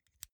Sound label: insert xlr extension; objekte